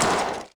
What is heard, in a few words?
grunt sheetmetal run